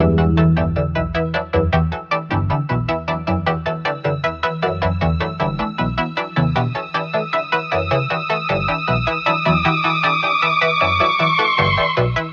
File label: anger building, Electronic Loops